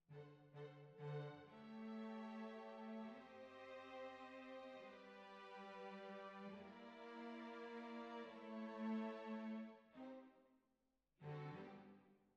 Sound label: Cbasses